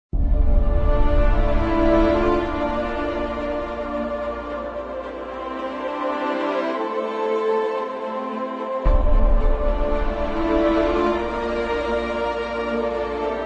Blockbuster Trailer Mid Section

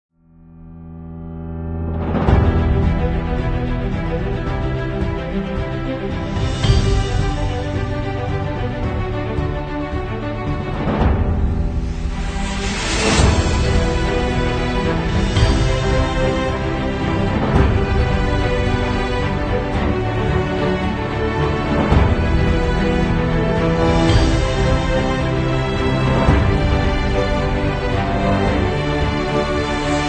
Epic

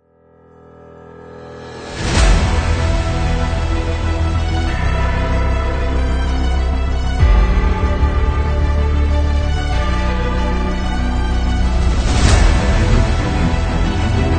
Tension Without Choir

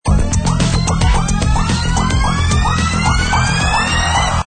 break; 音乐素材 企业形象介绍集3 Corporate Identity Intro Set 3

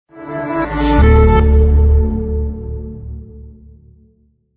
Particle Logo

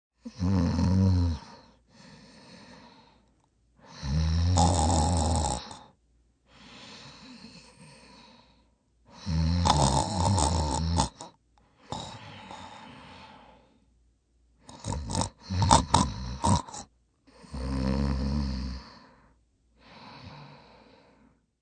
Snore Sleep

